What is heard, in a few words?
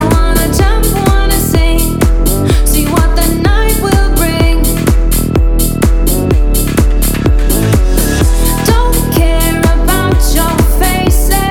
Techhouse Tune